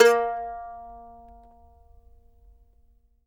mando notes A#3; Sampleheads; Whole Lotta Country 1 & 2

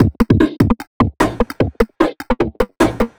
150 Krisp N Dry Drums, 150bpm; Combi Loops; Nu Dance Electro

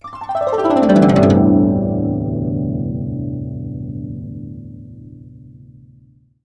GUCH GLS, GUCHIN; INIS - Opium; GUCHIN-GLS

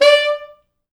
D4, Pop Brass

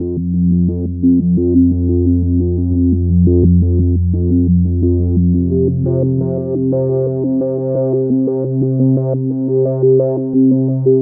Arpeggio Line, Synths

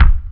kick bass; Drum Hits; BASSDRUM

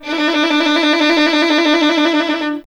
STR VLN, Solo Instruments; Miroslav Vitous

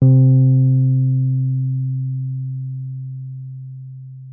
WURLITZER, C3; Xsample VOL-1; 90 s Sample CDs; WURLITZER RS

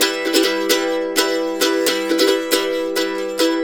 132, Blues Guitar RIFF

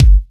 Kick, Kickdrum; Equipped Music; Loungin House

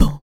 HIT, Jazz FX FEM PERC

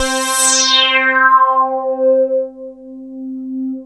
POS FILTER

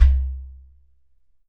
GHATAM

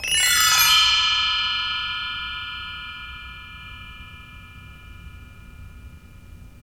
PRC BELL, Orchestral Perc; Roland LCDP03

